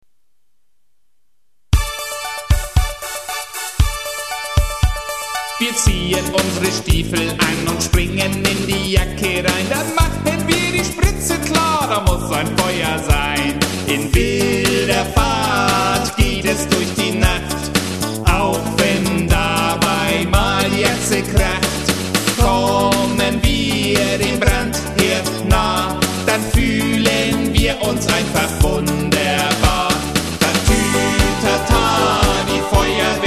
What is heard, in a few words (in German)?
Tatueue Tataa die Feuerwehr; Schuetzenchor